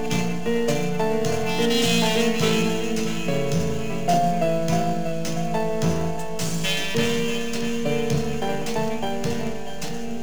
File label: piano